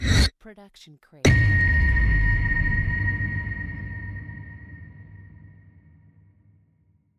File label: cinematic rise hit short ramp up; soundscrate